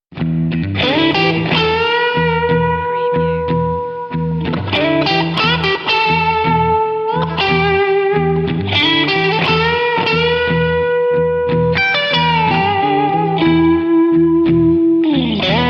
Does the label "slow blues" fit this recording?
Yes